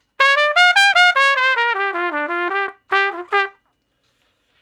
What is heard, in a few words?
Trumpet Shuffle (G); Solos